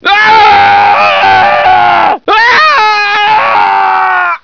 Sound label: sci scream